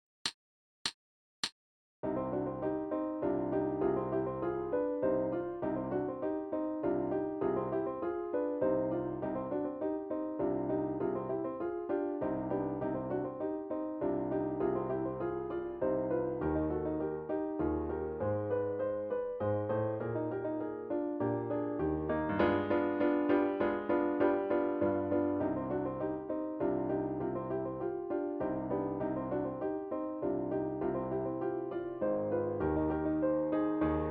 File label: accomp only; Solo Accompaniments; Unfinished Symphony; Schubert